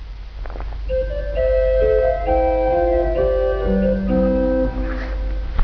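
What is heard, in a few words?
Rohrfloete 4, organ; Vitus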